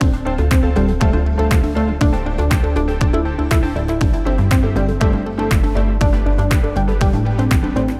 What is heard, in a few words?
Intro Loop; Summer Catchers